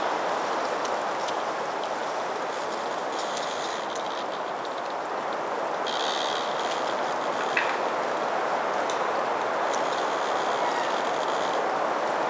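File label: ambience spring woods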